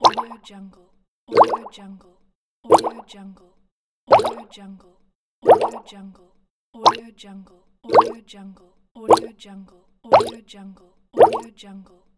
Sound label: bubble buttons